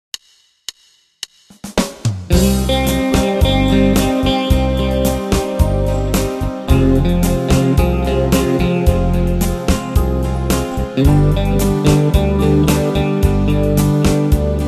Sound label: tango